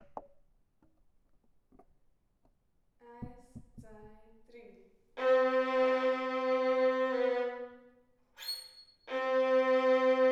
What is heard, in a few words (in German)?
Violine; Kinderorchester